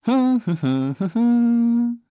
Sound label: voice humming